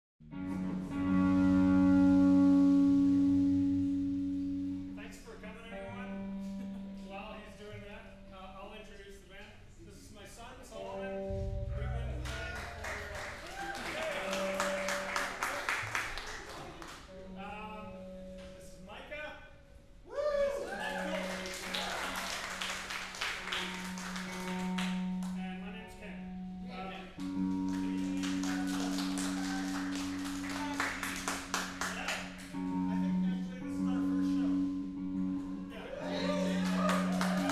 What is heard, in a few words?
Tunes His Guitar (Band Introduction); Live at Casse-Tete 2016